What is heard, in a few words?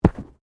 Body movement Fall Medium